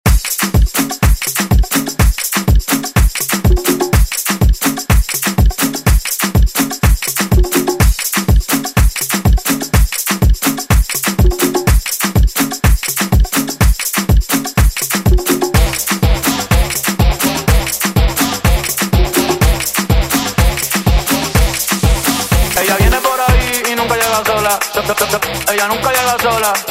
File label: Afro Tribal; Deejay Hit